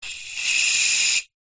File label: industrial gas release hiss short from gas bottle